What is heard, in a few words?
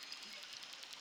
Background Noise Clips